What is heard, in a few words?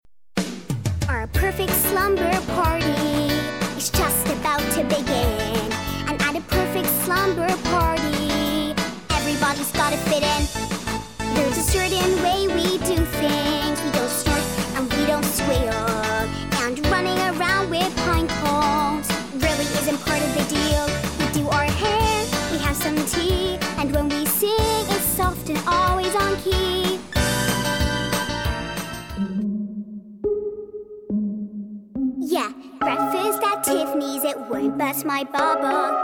Singing Montage